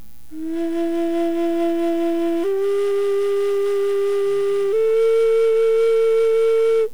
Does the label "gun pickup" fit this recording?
No